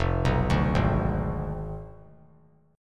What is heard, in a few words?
F Diminished 7th F down; Fdim7 arpeggiated; JGuitar